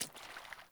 Sandals Run Water, Footsteps; Characters; Player

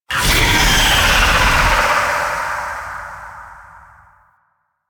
Vocal Shriek; Enemies; Warframe; The New War; Archon; Nira

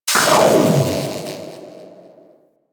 Bigalyst Electric Step; Enemies; Steps; Warframe; Teralyst; Eidolon

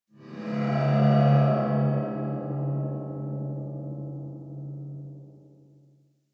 Low Ship Rand Amb; Ambience; Warframe; Grineer Galleon

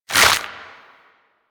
Tendrils Burst, Infestation; Warframe; Warframes

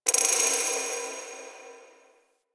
Moon Clockwork Texture Small; Ambience; Warframe; Orokin

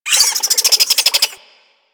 Infested Minion Pain; Warframe

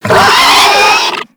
Infested Charger Death; Warframe

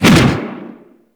Fist Whoosh; Warframe; Weapons; Archwing; Melee; Regor Fists

